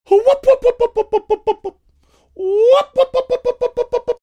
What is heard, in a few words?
making whooping sounds